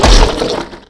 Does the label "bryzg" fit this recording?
Yes